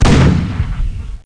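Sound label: Rifle Shot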